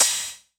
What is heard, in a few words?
crash, Hardcore Breakbeat; Big Fish Audio; Hardcore Breakbeat Action